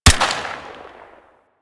Dex Furis Shot B; Tenno

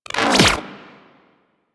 Split Laser Retract, Weapons; Warframe; Corpus

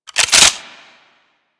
Sniper Rifle Reload; Grineer; Gorg

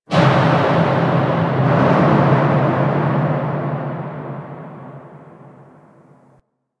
Hub Metal One Off; Steel Meridian; Warframe; Tenno

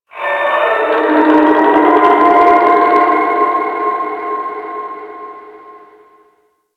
Ocean Dolphin; Ambience; Warframe; Grineer Ocean

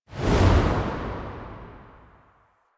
End Diorama Transition; Warframe; Lotus Eaters; Quests

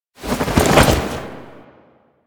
APC Jump Off Back Right; Enemies; Lasrian; Warframe

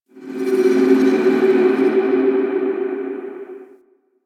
Ambience Hub One Off; Syndicate Codex; Warframe; Tenno

